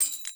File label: gold reward; Test Realm